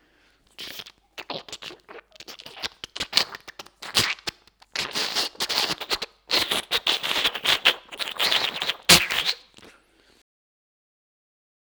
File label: plate rev nl